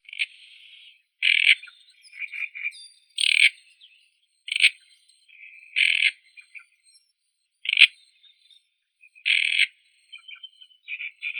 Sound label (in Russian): kamishovka drozdovidnaya acrocephalus arundinaceus